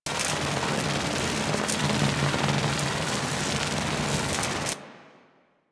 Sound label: Galleon Electric Beam Loop; Ambience; Warframe; Grineer Galleon